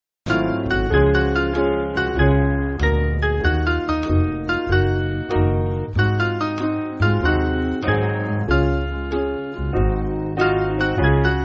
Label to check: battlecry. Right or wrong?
Wrong